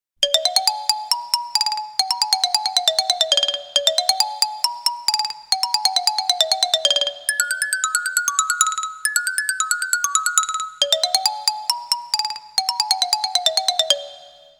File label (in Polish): ksylofon